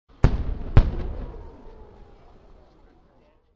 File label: Artllry Two Sht, Artillery two shots.; War